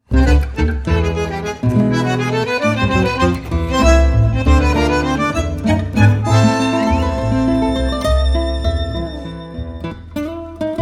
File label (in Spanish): Bajo un cielo, vals